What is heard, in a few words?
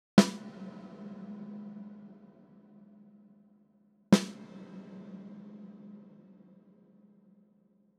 Sn church